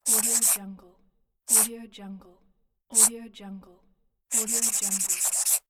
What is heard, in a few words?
marker drawing lines; fx-market